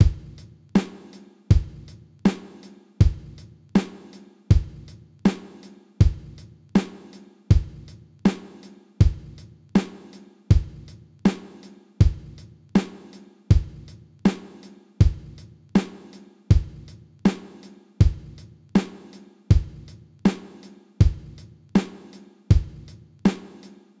hall resonance loop 80

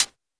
Hiphop hihat

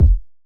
trap kick; Hiphop

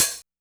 Modern open hat, Electro

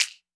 clap; Pop; funkvol1

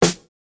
snare, snare house

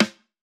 snare samples, Rock

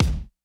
kick; funk

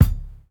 kick, house

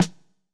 hiphop snare, Effect on

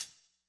hihat; pasific; Pop

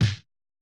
snare; Reference Beat BPM-130; Pophits

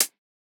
Electro hihat; Reference Beat

